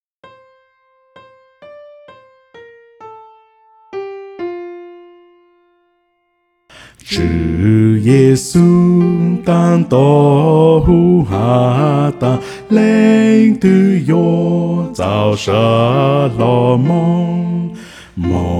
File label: bass